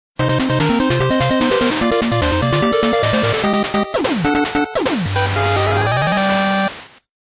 stage start; megaman

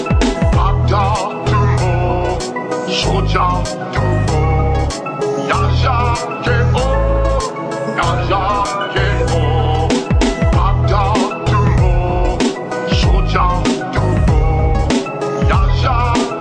Klingon Song

